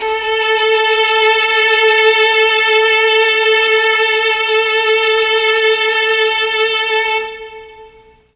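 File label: A2 violins, mellotron; 3 VLNS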